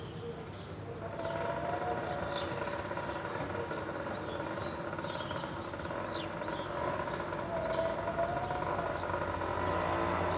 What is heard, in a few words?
Neighbourhood